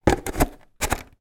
household dustbuster handheld vacuum cleaner nozzle twist on